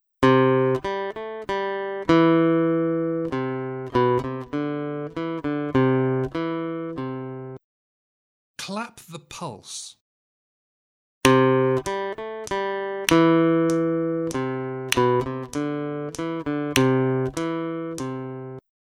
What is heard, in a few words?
Aural Rhythm Test, acoustic guitar; Grade 1